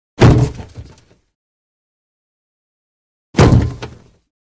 Small Body Falls On Metal Light Body Fall On Metal; Hollywood Edge Foley Sound Library